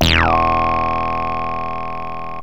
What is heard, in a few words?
bl dist, yamaha rm1x synth bass and lead